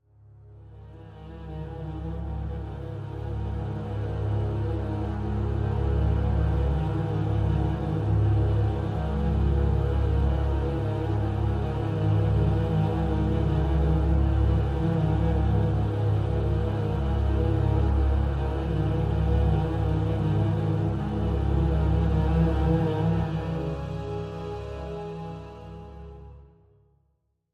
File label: Only Strings